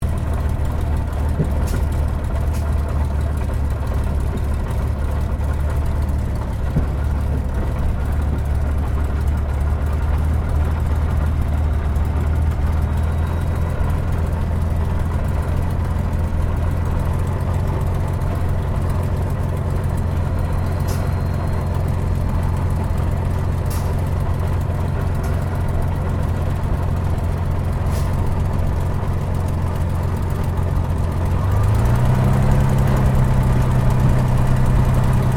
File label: AIRCRAFT SUPERMARINE SPITFIRE MK-VIII IDLE INTERIOR; Airplanes; Vehicles; Airplane Sound Effects